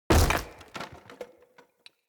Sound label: Barrel Wood Hollow Medium Destroy